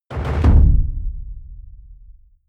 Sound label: heavy sub noise stutter; whooshes rises; hits impacts